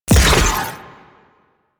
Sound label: Conduit Proj Explosion; Sentient